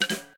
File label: Cancel Click